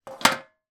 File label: household grater metal down on surface; zapsplat